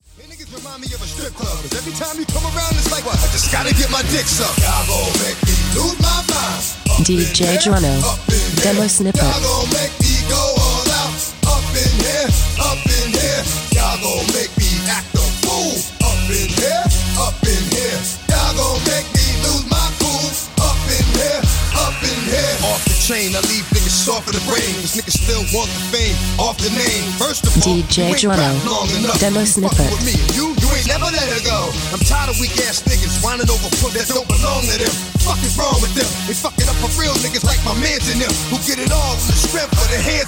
105 bpm Ebm

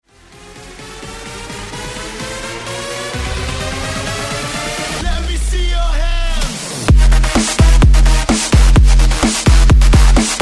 128 BREAKMIX MASHUP

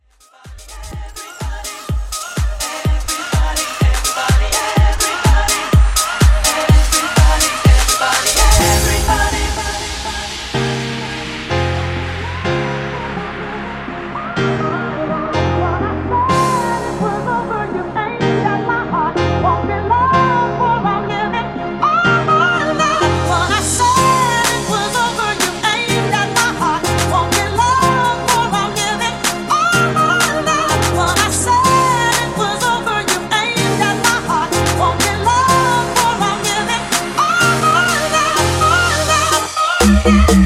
Deep House Rework, 125 bpm

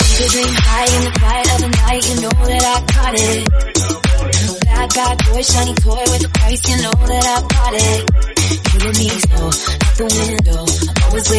104bpm Fm; Redrum; remixplanet

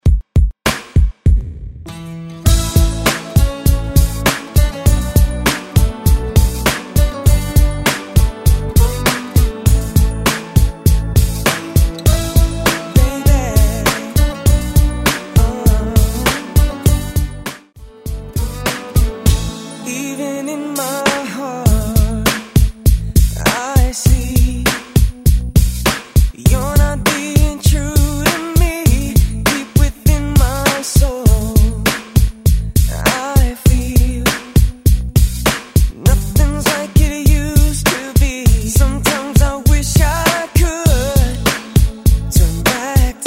90s redrum

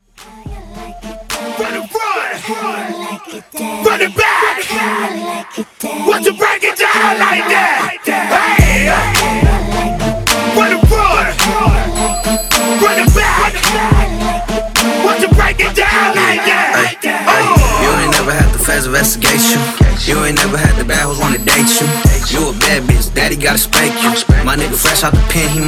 Acap In Mashup 107 BPM

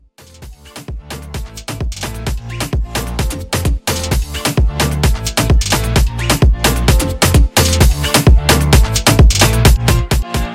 Afro Remix 130

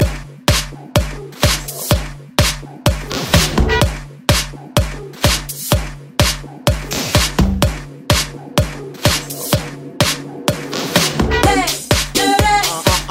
Bass House Edit

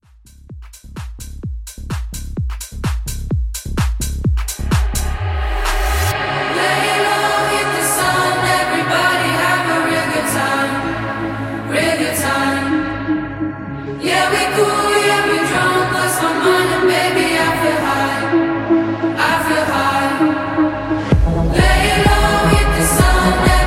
House Redrum Intro; Remixfreakz

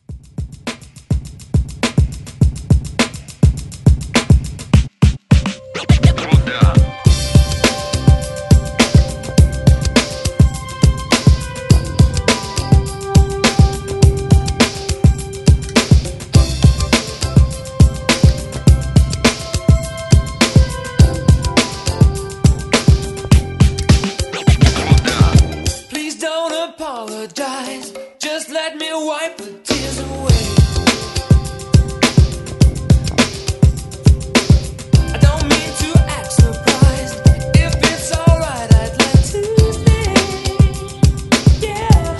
80s ReDrum 103 Bpm; remixplanet

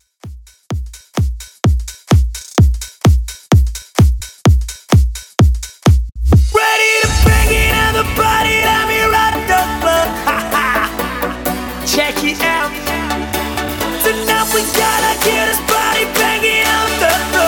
Dance Redrum 128Bpm Dirty

